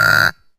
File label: pig oink; Farming